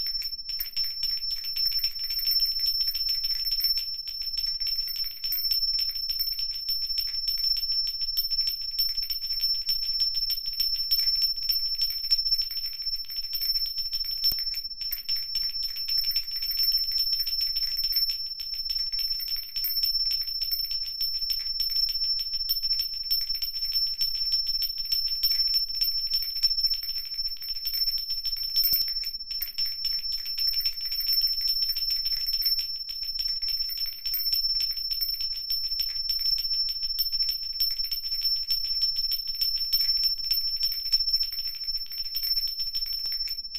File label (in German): Zimbeln lang